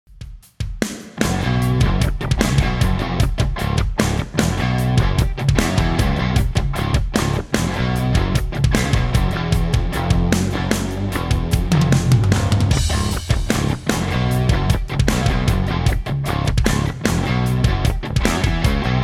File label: funky